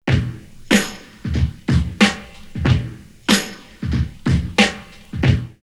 Glitter & Noise 93, drumloops OldSchool; X-Static Goldmine

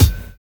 PHAT BELL; Univers Sons - Kitch 70s

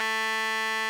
RMI; Sonic Reality - Vintage Timetraveler

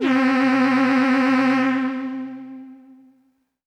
Synth C, Synth Prophet One Shots; Electronisounds

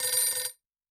Ring; Yamaha DTXpress-II

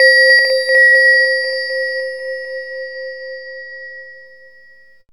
synth bell; Zero-G Ambient 1

